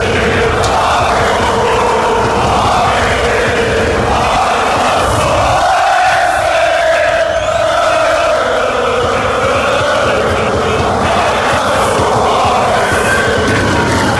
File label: allez ho allez he allez, fanchants; karlsruher sc